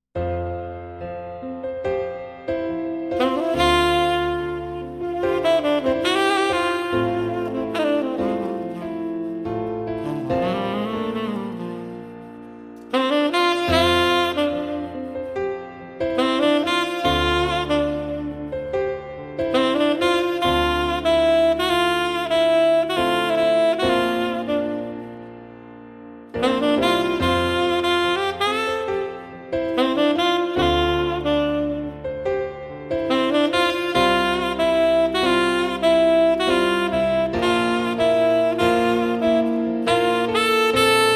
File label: sax, Contemporary & Jazz Saxophonist